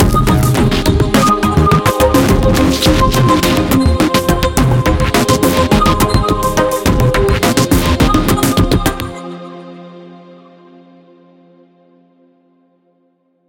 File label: Fight Low Combo; Remember Me